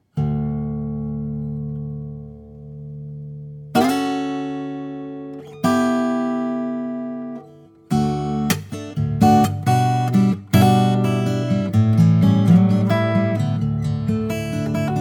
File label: Guitar